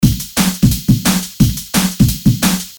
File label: Breakbeat 175; Origins